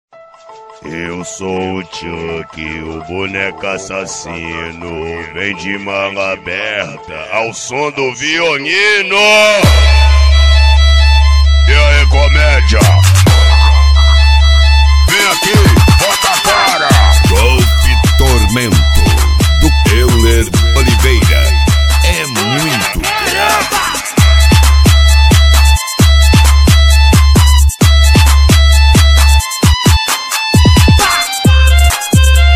violino cortado; golf tormento do euller

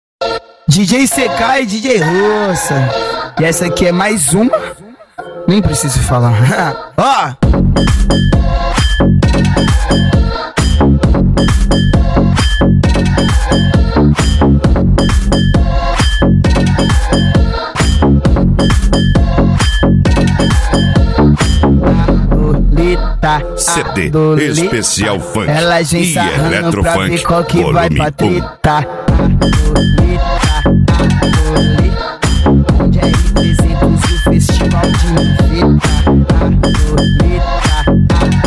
especial funk e eletrofunk

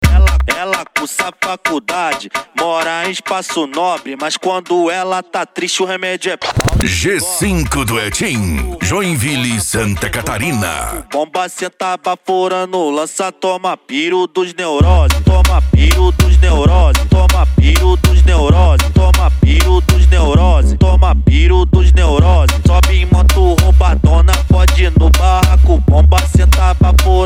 mega funk e funk mega bass

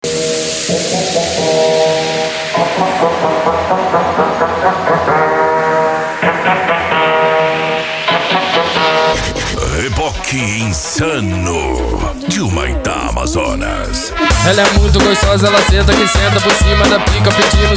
noia dance e funk mega bass

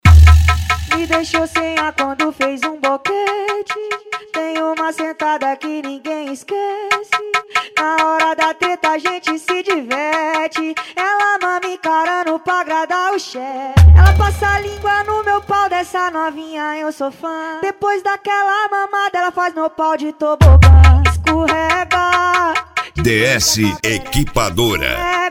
funk grave

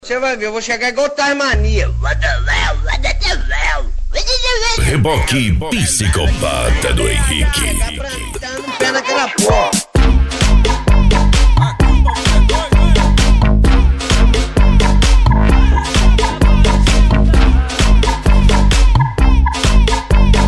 eletro funk

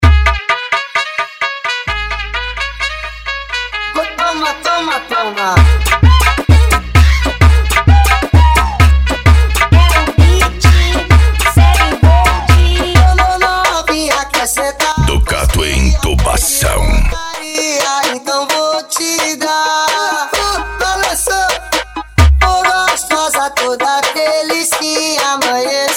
funk agressivo

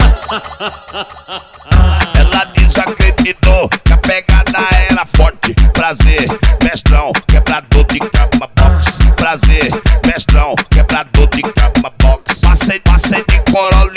funk sertanejo

